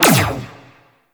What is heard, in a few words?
Laser Fire; Warframe; Teralyst; Vomvalyst